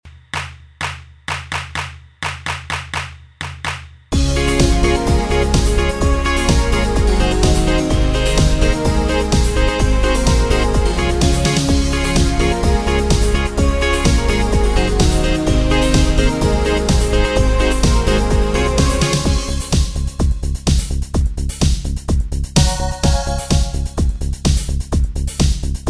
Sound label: medley, K800